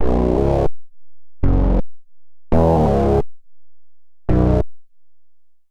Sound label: Bass Db Minor 84 bpm, Bassline; MIDI Clips; Tonal; Fluff Face; Ableton; Factory Packs; Chop and Swing